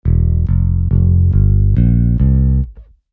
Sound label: Bass Tabs